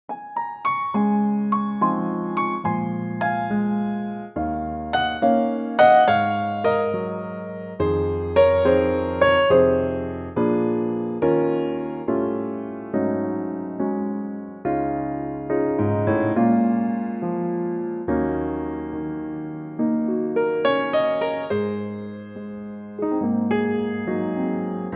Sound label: Db Piano